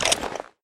aug clip in; weapons; 2aug

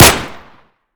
usp unsil; weapons

